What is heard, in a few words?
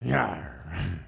Sleep; creatures organic